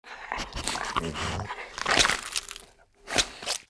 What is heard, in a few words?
dog eating